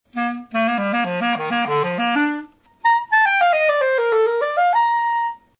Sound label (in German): chalumeau klar, clarinets